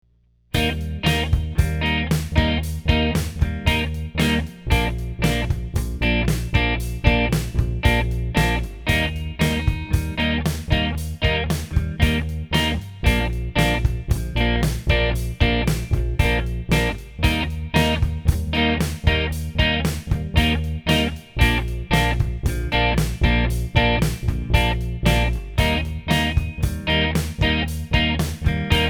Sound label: funny soundtrack